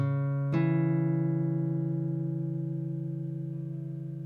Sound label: interval dm arp, guitar